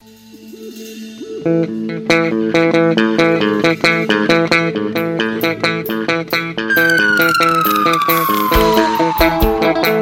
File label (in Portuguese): abertura